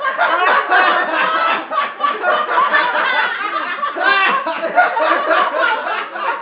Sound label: laughter